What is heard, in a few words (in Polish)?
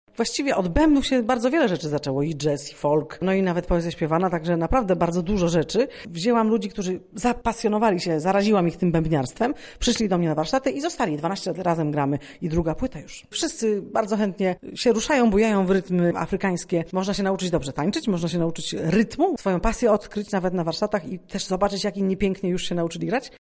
Młode Djembe